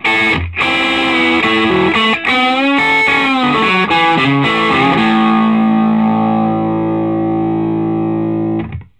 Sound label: Vintage Blues Guitar, SOLO